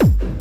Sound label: Hard Kick